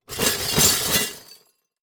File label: Foley Metal Pieces Bells Plastic Tub Rattles